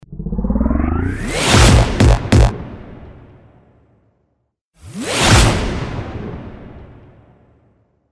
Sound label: rewind up and boom